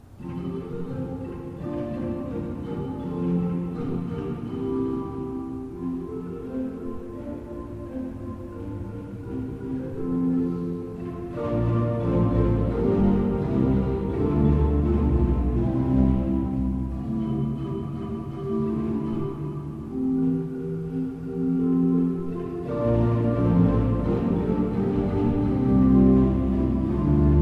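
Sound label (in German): Seifert Koeln, pfeifenorgeln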